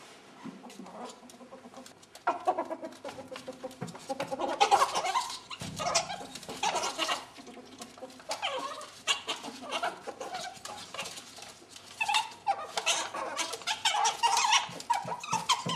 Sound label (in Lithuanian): bendravimas aiskinasi santykius, ferret